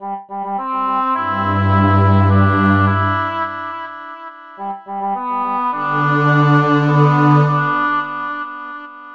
Orchestra 105bpm

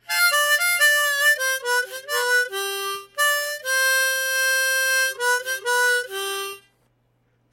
Harmonica

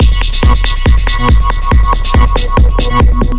Groovy House Loop

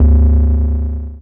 Moog Bass Loop

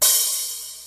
Long Open Hi Hat

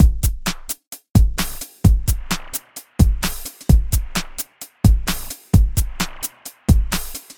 Nu Skool BreakBeat

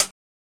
HiHat

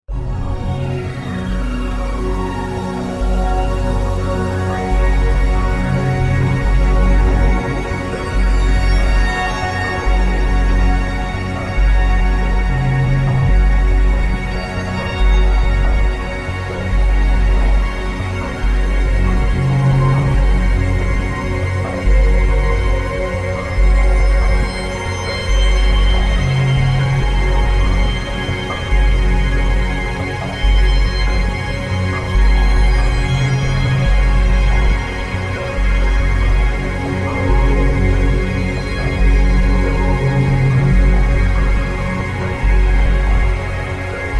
Outer Space Pad

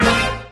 Jazz Hit

